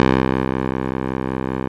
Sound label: Juno Clavi; Club-50 - Foundations Roland; Clavinets